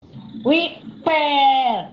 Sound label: wipeee